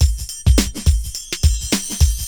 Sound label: BEAT, Hip Hop, 102-105bpm; E-MU Formula 4000 Series Vol. 1; Hip Hop Nation